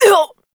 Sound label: androgynous exertion; Warframe; The New War